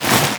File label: foley run; archer